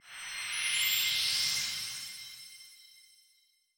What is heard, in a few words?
shine loop; natsume